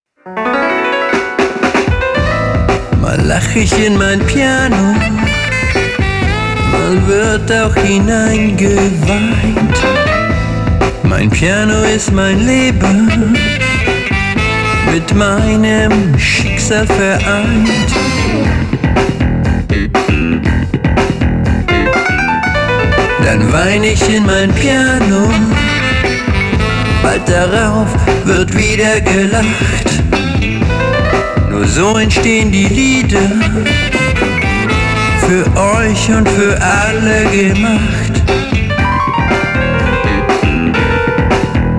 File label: Piano